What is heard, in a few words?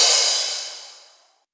Crash; Vengeance Samples; VEE Cymbals